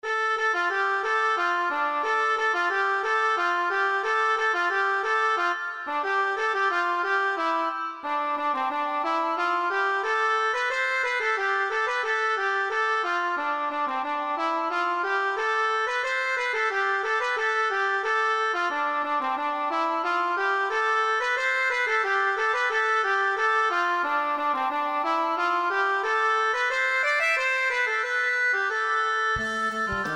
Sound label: Hanter dro an dro